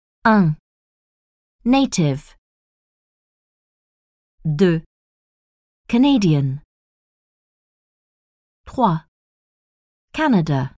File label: techno